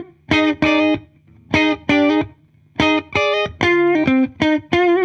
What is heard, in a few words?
70s Strat, Guitar, 95bpm, F, dusty funk